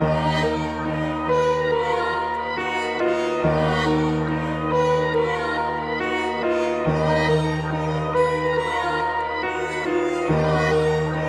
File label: 140 BPM D Min, Melody Loops - Trap; Death